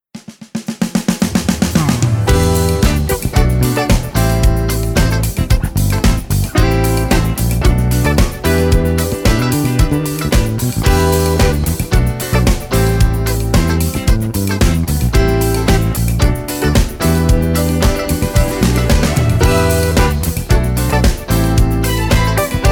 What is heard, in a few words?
Instrumental